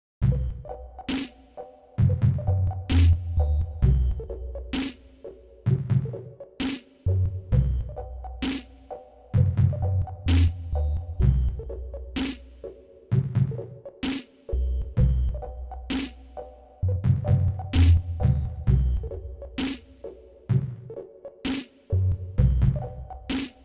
sinewave

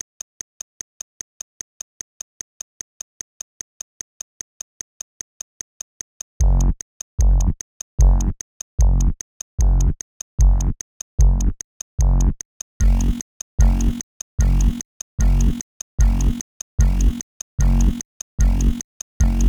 Sine Wave

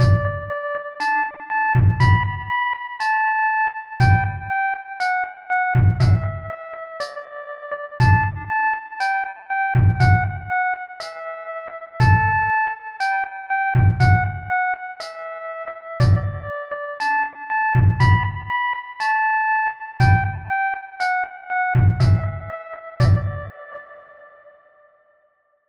Sinewave

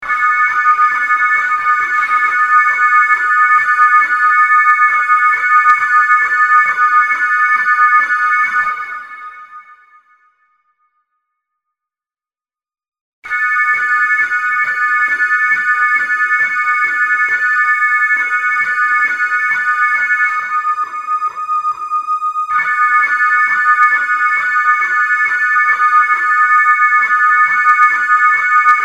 sine wave

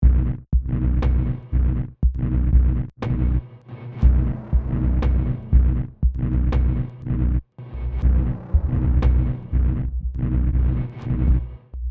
sine wave track

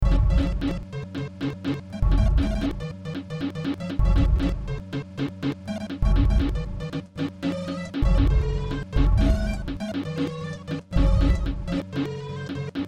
Sine Wave

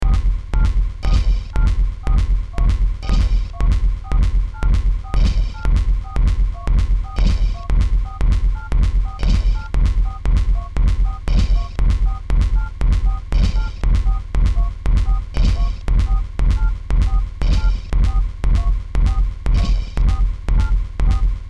Sine WAV

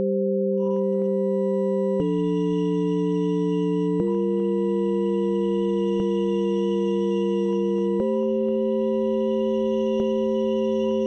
Sinewave

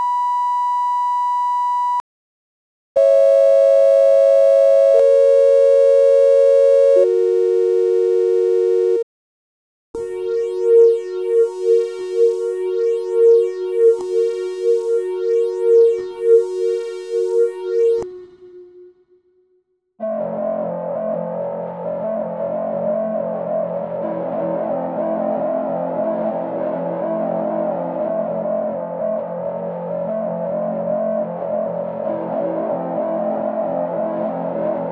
Sinewave

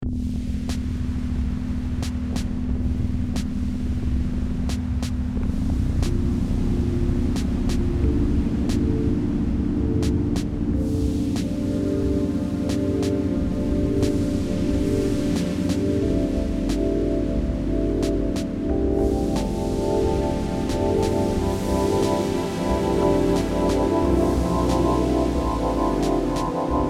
MIDI

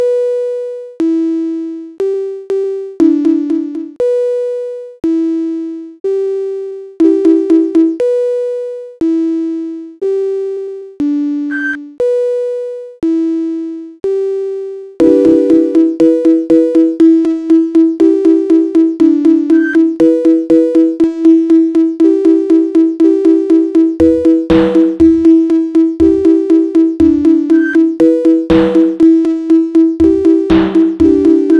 sinewave

